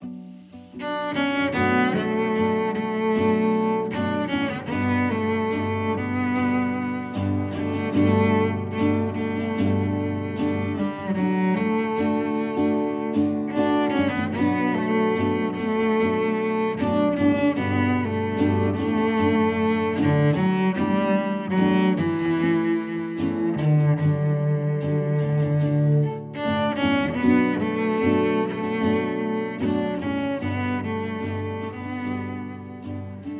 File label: Guitar and Cello